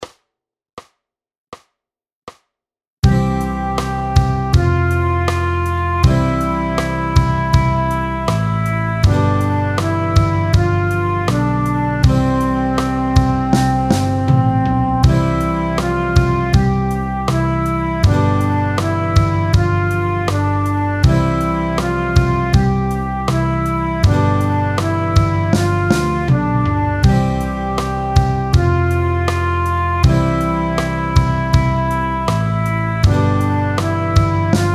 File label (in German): Summ playalong